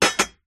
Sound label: household mug set down on coffee machine metal tray